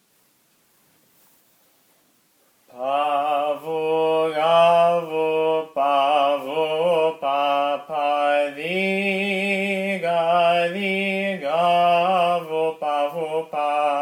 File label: Aposticha 1 - Tone 1 Slow, byzantine chant